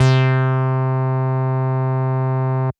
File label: DnB Bass C3, drumnbass 170bpm; musicradar; Bass A Multis